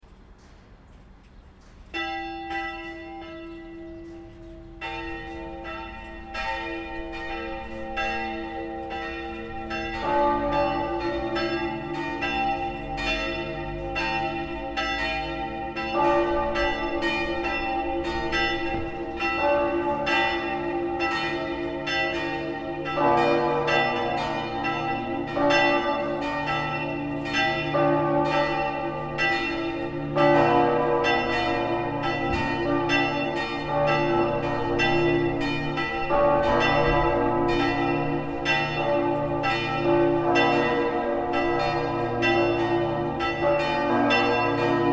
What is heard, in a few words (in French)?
PAYERNE ancienne sonnerie